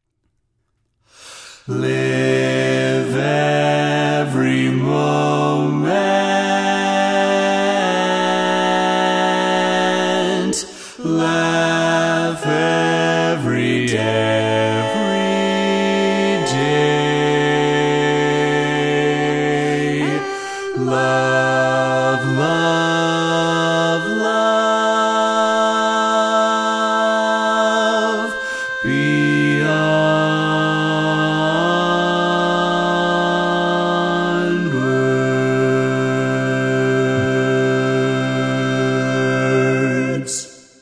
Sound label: bass